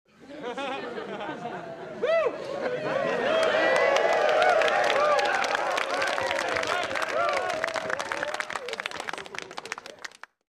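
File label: Crowd 50 With Cheers Applause; medium int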